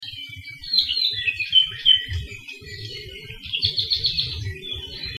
apapane call; Saddle Road Birding

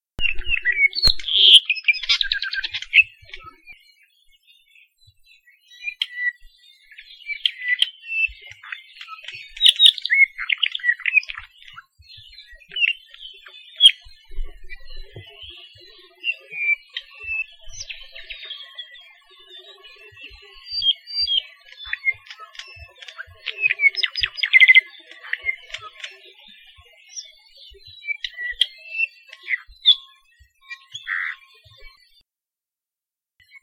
apapane long; Saddle Road Birding

